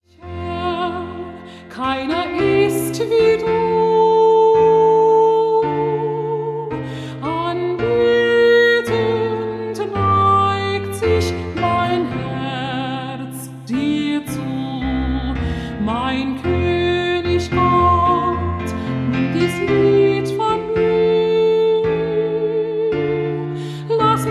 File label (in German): Choral und Chorus; Quodlibet